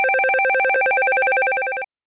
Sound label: ringer, ringtones